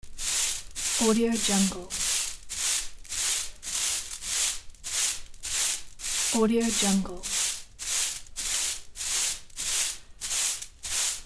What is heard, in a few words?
broom